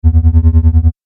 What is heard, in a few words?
Laser Beam Noise, Laser Sound Sound Effects